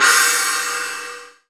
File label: CHINESE PRC, PERCUSSION; AKAI S6000 CD-ROM - Volume 1